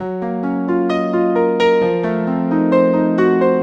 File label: Piano, Acoustic Pianos